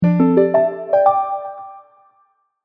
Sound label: logo